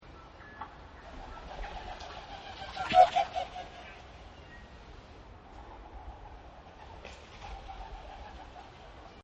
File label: Wood Pigeon Flyby; Birds; Animals; Partners In Rhyme